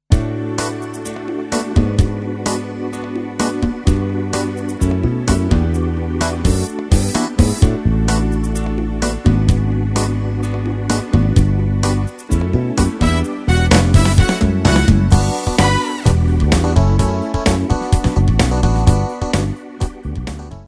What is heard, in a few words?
Karaoke backing track; Sister Act